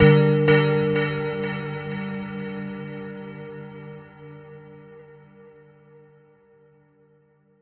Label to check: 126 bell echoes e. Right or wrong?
Right